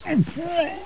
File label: die; svencoop; hunger; hungerbarney